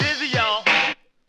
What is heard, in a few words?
snare, Drum loops